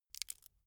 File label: bamboo small break soft